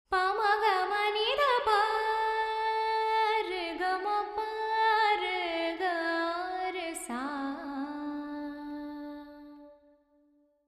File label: Verb 4-4 D 70, Vocals Female; Sample Banks